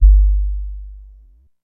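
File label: SP1200 kicks; Rubber Chicken Software Sample Bank; DRUMS + PERC; EMU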